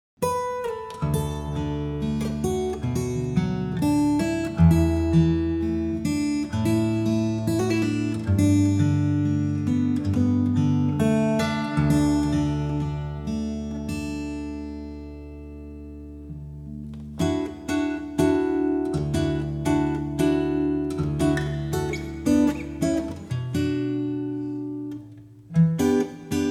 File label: Jingle Bells